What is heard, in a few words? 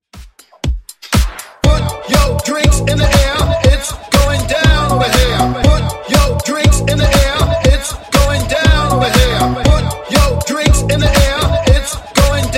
Funk Hype Intro Outro Dirty 120 100 Bpm